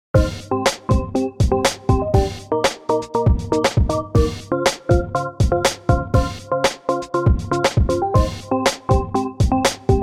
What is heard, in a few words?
ELECTRO; ABLE ELECTRO